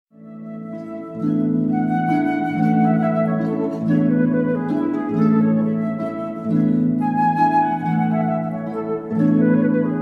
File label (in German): Transition Floete Streicher